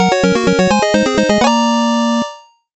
BGM Fanfare

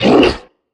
troll monster attack fast; Infernal Radiation

